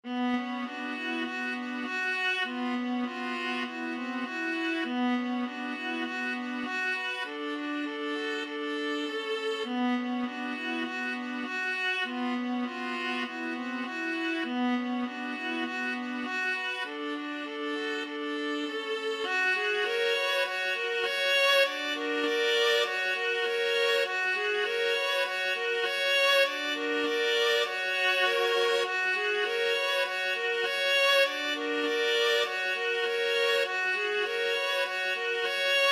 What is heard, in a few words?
grieg mountain king viola trio